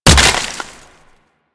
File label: Ice Hit Effect; Warframe